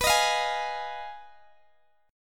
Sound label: B 6th Flat 5th B down Guitar Open D